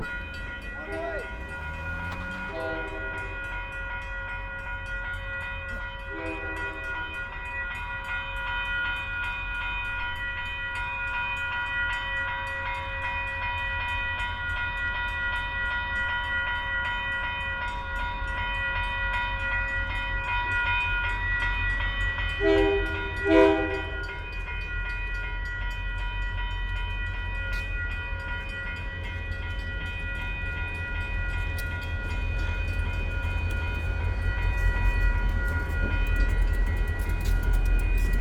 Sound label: US train, California Train